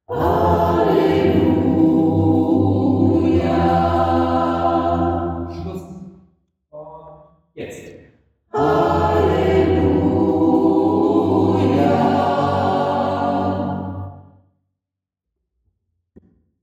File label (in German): Hallelujah Tutti; Engel und Schluss